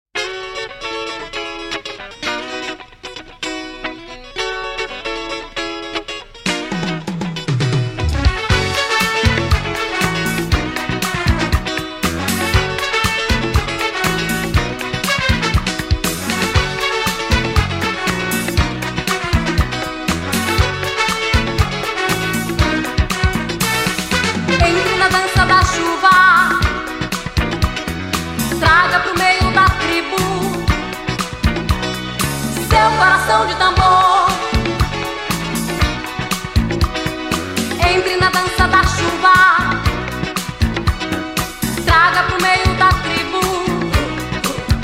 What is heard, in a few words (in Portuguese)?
toque tambor